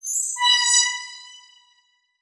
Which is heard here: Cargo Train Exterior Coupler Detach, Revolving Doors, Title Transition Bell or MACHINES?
Title Transition Bell